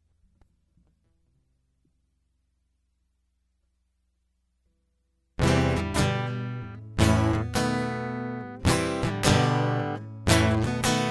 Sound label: Guitar Loop